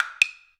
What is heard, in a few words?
Bangzi, notifications; Itel S25 Ultra